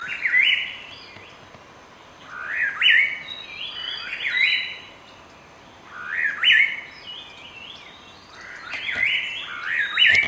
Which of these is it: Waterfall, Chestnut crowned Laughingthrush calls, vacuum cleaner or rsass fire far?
Chestnut crowned Laughingthrush calls